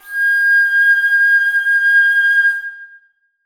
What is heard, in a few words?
alto flute g6; Sonatina Symphonic Orchestra